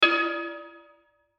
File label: frying pan